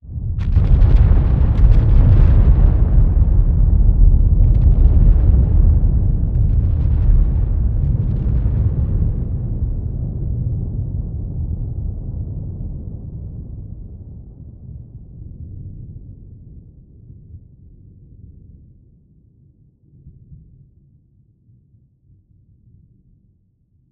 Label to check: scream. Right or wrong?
Wrong